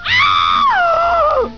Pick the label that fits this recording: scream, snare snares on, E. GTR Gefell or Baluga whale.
scream